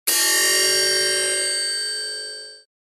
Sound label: Distortion Ring Elon